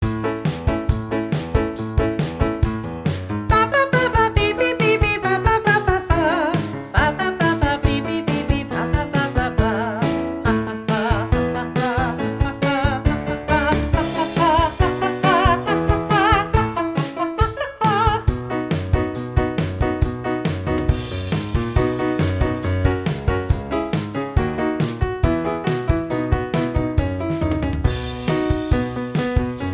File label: backing track